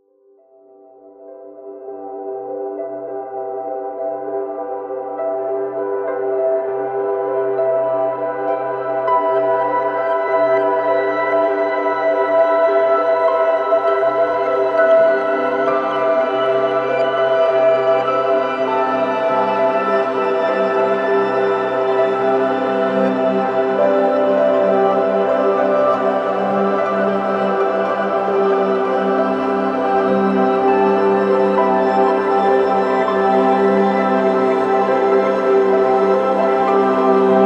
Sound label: Galaxy Harp; Kompose Audio - Galactic Voyager Series: Arcturus Nova; Kontakt